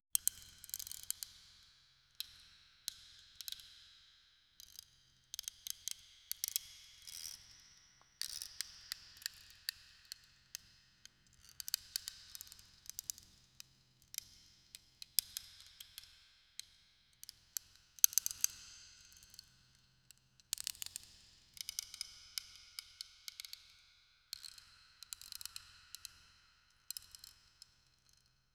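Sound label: very quiet theatre rigging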